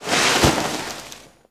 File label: foot prone grass; footsteps